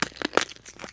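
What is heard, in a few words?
neck break; PC Gamer IT CD 49; UrbanChaos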